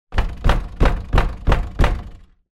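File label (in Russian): болтается дверь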